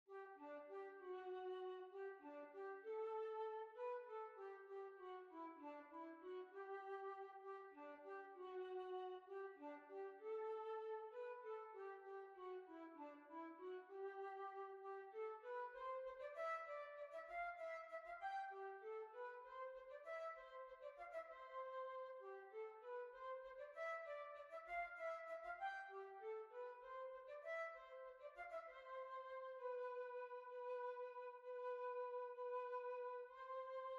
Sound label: Waltz